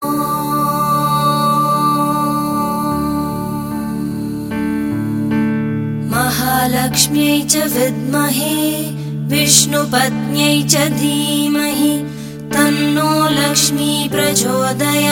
Gayatri

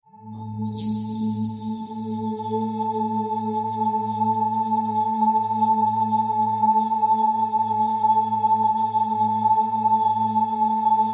Mystic Trance; 3D Tones

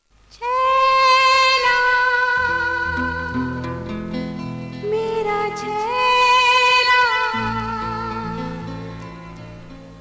Female; Chaila Babu (1977)

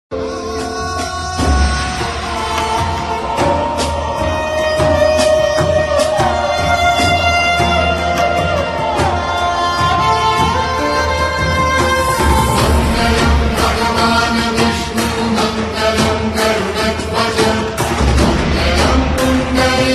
Romantic BG Tune; Madhav Manu; TV Serial Tone; And TV; Adhuri Kahaani Humari

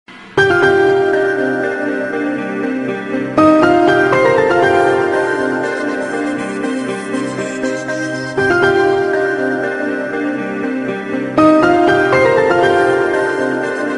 Tune; Geet Pari; TV Serial Tone; Star One; Geet - Hui Sabse Parayi

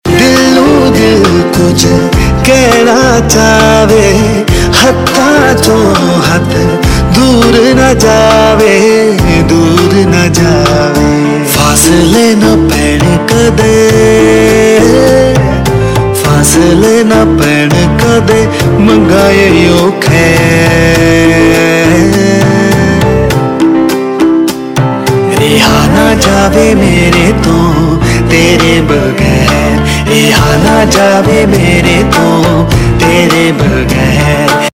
Cover Ringtone, Punjabi

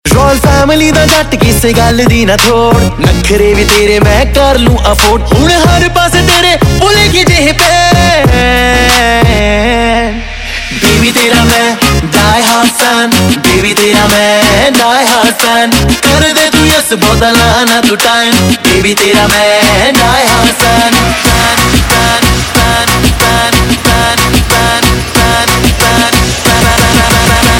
Ringtone, Punjabi

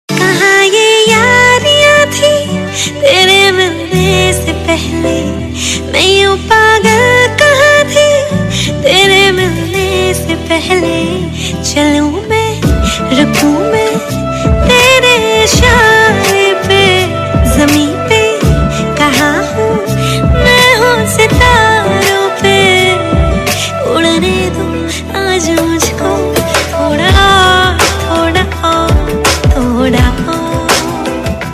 Female Version Ringtone, Bollywood; Ranchi Diaries

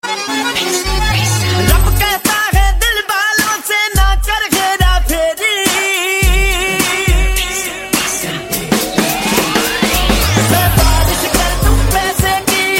Ringtone, Punjabi Pop

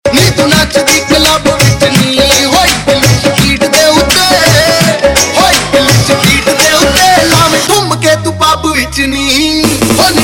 Club Beat

